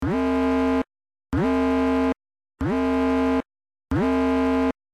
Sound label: Sci-fi alarm strong dramatic loopable